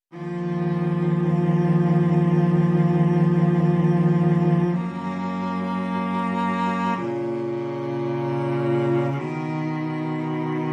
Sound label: Strings Mix